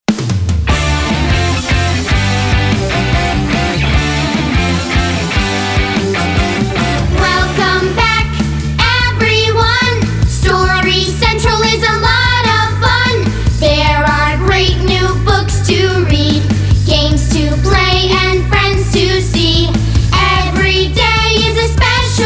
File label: Kids Pop Rock